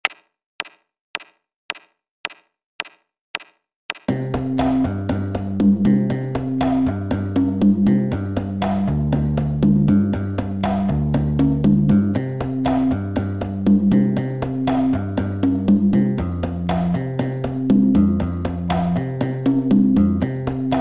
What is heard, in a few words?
Latin Cm Play Along